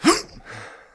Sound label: Swing; police